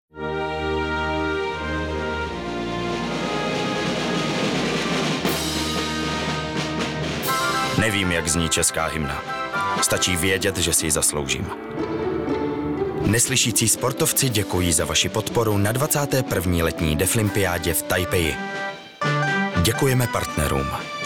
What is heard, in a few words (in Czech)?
Hymna CZ; Deaflympic